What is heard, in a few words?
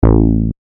Bass Shot, A